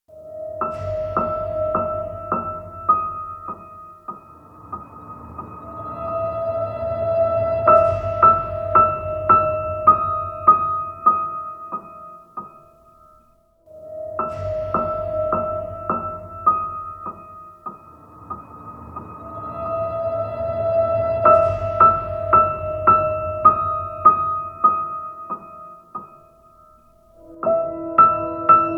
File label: Strange Place, Tension 공포